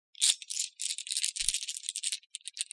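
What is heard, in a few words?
dice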